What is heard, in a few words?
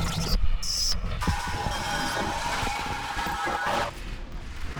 Glitches